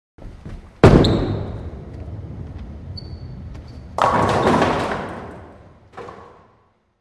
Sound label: Bowling Ball Rolls